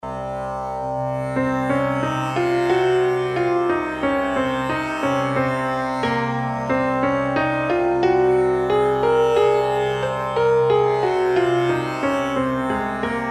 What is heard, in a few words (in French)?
locrien musique, les modes